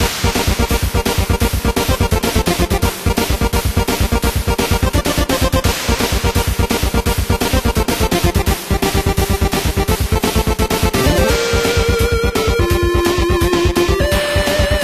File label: Aggressive; Super Metboy